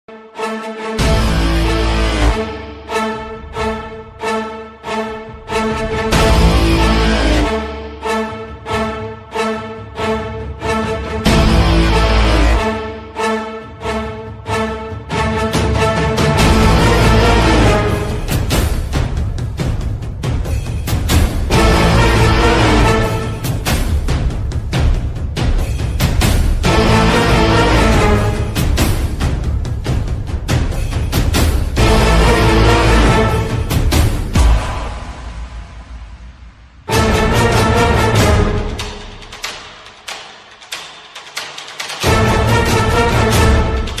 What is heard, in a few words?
BGM; Vikram Vedha